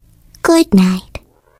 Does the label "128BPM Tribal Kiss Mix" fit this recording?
No